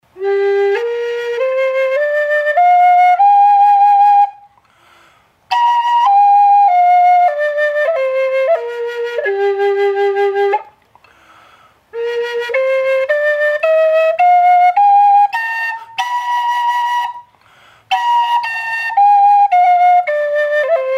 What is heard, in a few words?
Gm dry, flute